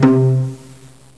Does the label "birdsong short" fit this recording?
No